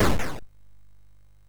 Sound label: sid MOS6581R3; hvsc